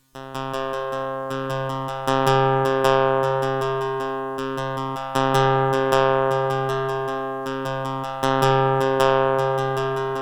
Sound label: Delay Patch Impulse In